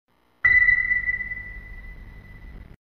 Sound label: Sonar ping classic